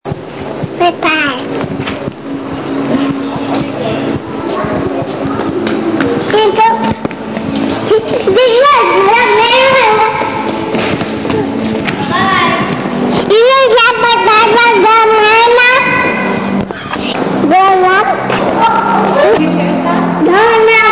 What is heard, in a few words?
flies trains